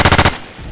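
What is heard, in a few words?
famas, weapons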